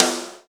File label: drums; fofix